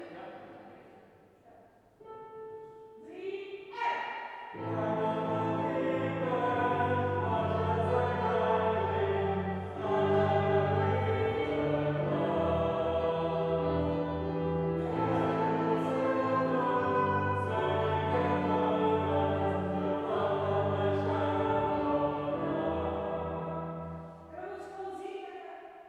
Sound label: BAS